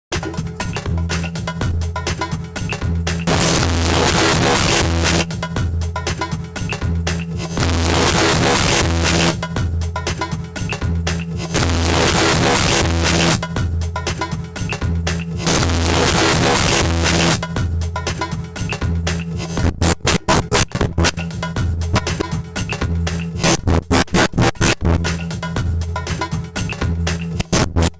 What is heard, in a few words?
bend